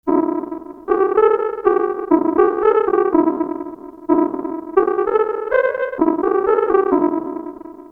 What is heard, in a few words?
Ring Mod